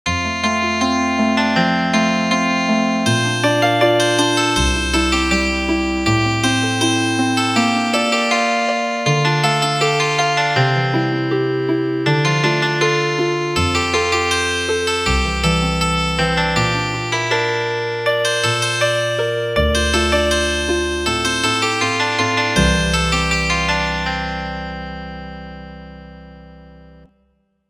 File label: Healin Harp